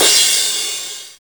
cymbal crash sound D key, Royality free crash cymbal sound tuned to the D note.; Royality free crash cymbal sound tuned to the D note. Loudest frequency: 642